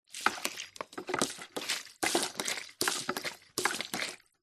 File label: industrial pump hand plastic small pumping water plastic bucket